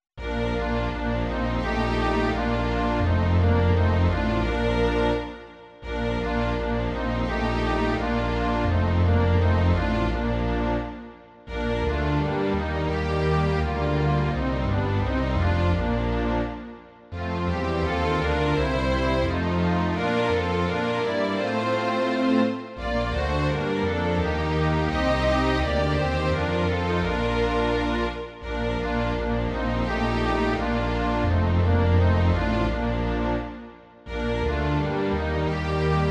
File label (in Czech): smyčcový orchestr; Kdo za pravdu hoří; Imaginativní verze